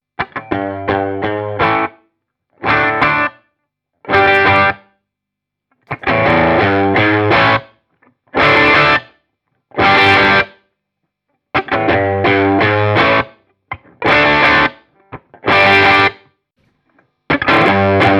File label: Humbucker Marshall